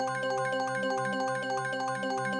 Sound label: reel spin slot